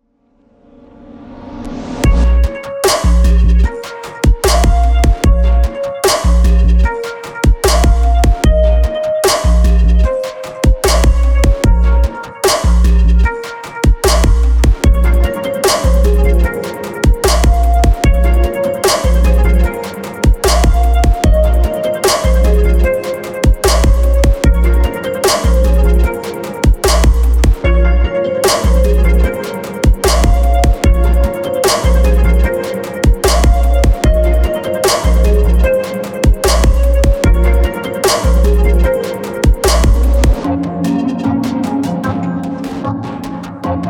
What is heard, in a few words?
found keys full instrum